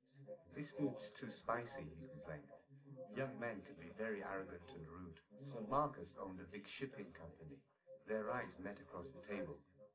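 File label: male, live recording 250ms 1m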